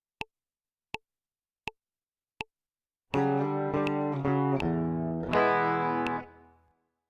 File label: intro, guitar